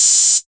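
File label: Analog Hi-Hat Open; Yamaha DJX-2B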